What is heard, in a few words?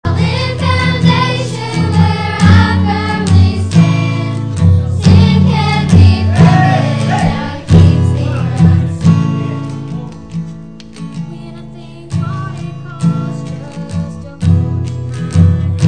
singing Youth